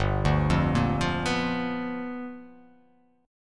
G 7th Sharp 9th G

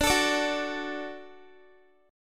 D Major D down Guitar; strummed; tuning 4E,4A,5D,5G,5C,6F

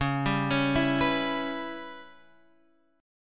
Db Minor 7th Flat 5th, Guitar, Half Step Down

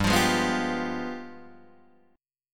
G 7th Flat 9th, Guitar, Open D

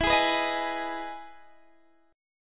E Major 7th E down Guitar Half Step Down

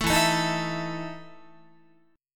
E Major 7th Suspended 4th E down Guitar; EM7sus4 strummed

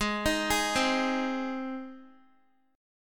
G sharp Major, Guitar; arpeggiated; x,11,13,13,12,x; 4E,4A,5D,5G,5C,6F

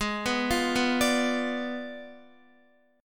G sharp Diminished 7th G sharp, Guitar; G#dim7 arpeggiated; JGuitar

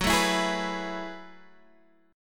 Gb Minor 11th Gb down; Gbm11; strummed

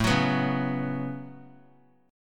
A 6th Add 9th A down, chord; A6add9; strummed

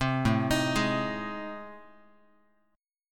A Minor Major 7th Flat 5th, Guitar; arpeggiated; AmM7b5; JGuitar

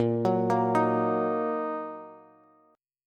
A sharp Suspended 4th Sharp 5th A sharp down Bass; arpeggiated; JGuitar

